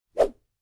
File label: Short Transition Sound